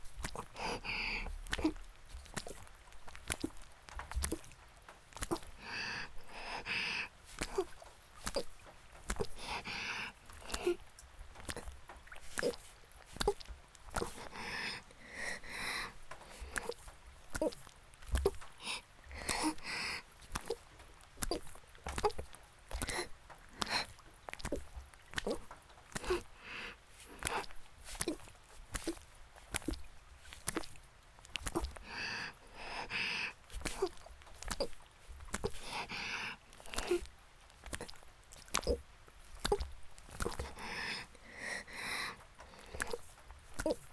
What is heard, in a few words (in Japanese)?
雨降り ギシアンループ; 田舎の別の無感情少女と自己犠牲愛; 雨降りバージョン